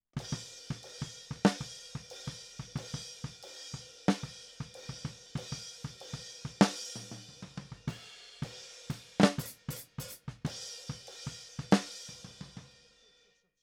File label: Snare